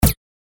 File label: stop, slot